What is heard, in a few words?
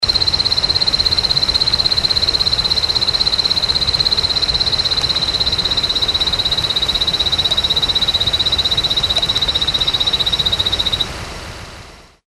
Boophis andreonei